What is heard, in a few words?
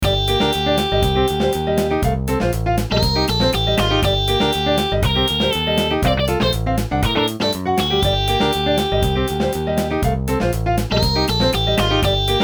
latin midi